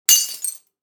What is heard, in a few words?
Thin glass smash